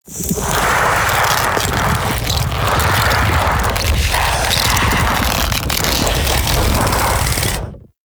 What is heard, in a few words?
Bullet Orb Charge; Enemies; Abilities; Warframe; Zariman; Void Angel